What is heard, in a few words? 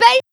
Percussion; Vengeance Samples; VEH2